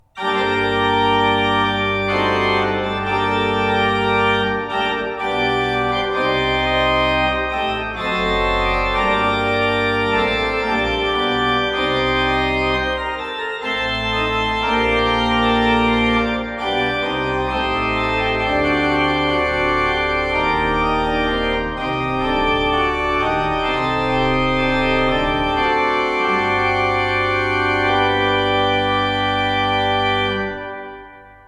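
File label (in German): Van Vulpen 12 II P, pfeifenorgeln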